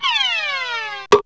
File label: Cartoonish Sound; PC; MARIOTEACHESTYPING2